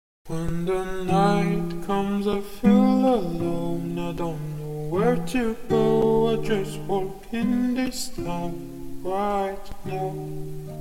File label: chill out, 100, G, Acapellas